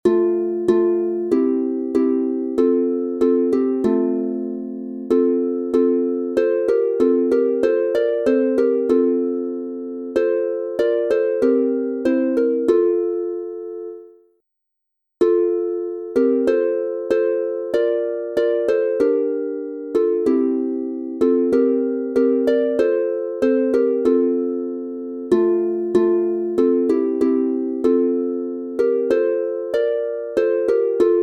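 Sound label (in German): F Dur 3 stimmig instrumental, Harfe; Auf ihr Hirten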